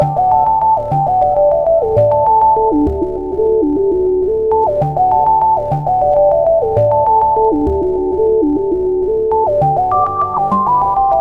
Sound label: big dice, ringtone; Lg